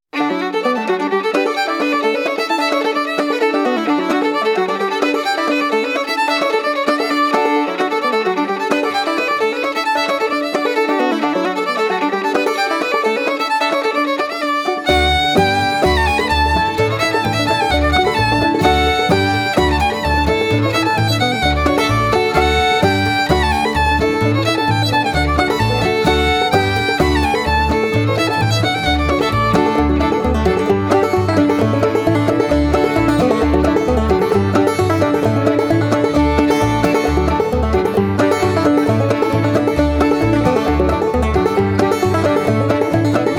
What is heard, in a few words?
banjo